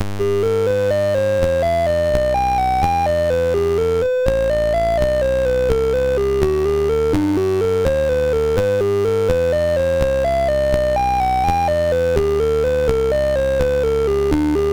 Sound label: Demo Music sid MOS6581R2